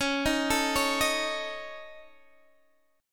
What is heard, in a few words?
C sharp Suspended 2nd Sharp 5th C sharp Guitar DADGAD, chord